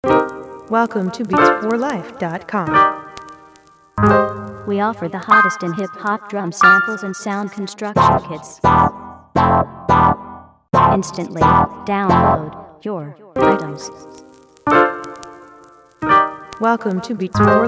hood keys

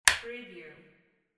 light switch

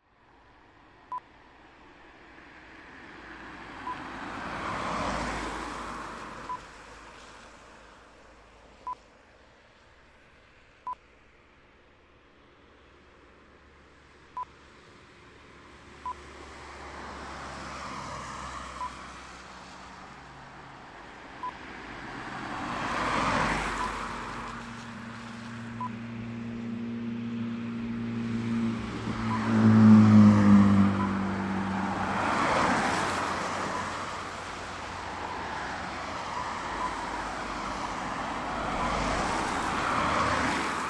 Cars Pass Wet Road

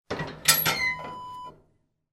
sauna stove door open

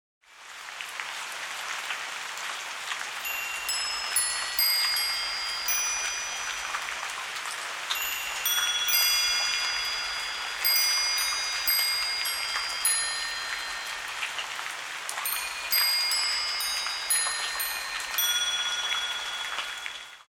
Rain and Wind Chimes subtle

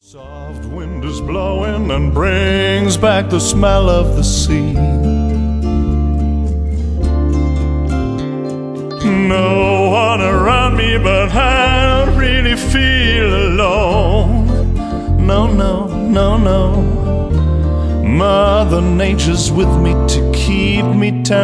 bright lovely summerday jazzy

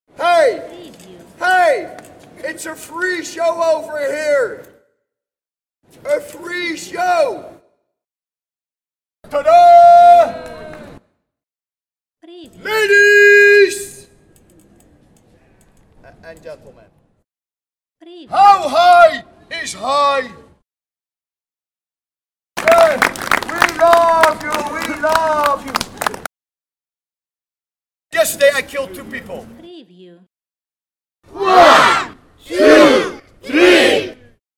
Street Artist

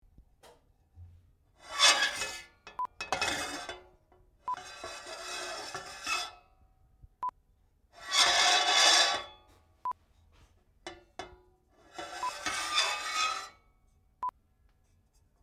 Frying Pan Metal Scrap

